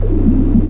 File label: arc; palace; Emperor - Battle for Dune